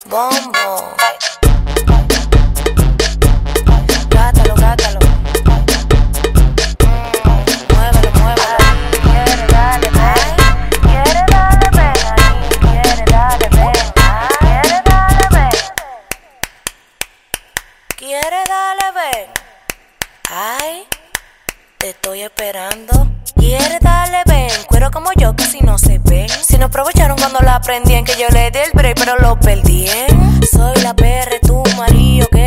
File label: Bing Bong